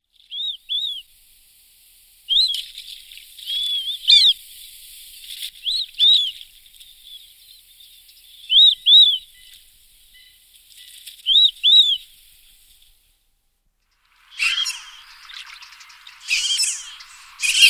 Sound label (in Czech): lžičák pestrý